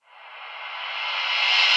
reverse sfx; drumkits; reverse 2 sfx; fl studio

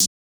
snare; maily plugnb; fl studio; drumkits

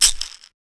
shaker (sped up for plugg beats); drumkits; percs; zay, sped up for plugg beats; fl studio; xander brown drumkit

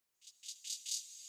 hh roll fx; drumkits; fl studio; goodtakimu drumkit

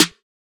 snare; FL Studio; xander brown drumkit

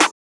snare; goodtakimu drumkit; fl studio; drumkits

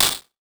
perc pochti clap; Cymbals; drumkits; fl studio; goodtakimu drumkit

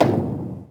perc stomp, mixed; drumkits; pierre stomp, mixed; fl studio; xander brown drumkit

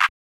muffled clap; drumkits; fl studio; maily plugnb

